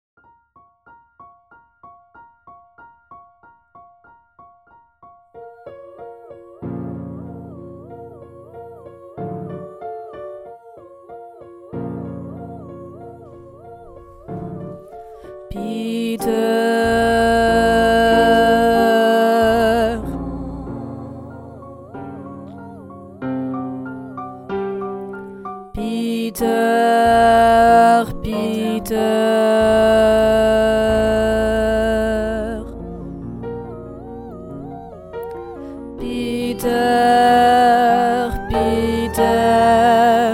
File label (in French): Ouverture Basse